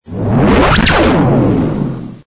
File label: ray gun; Mike Koenig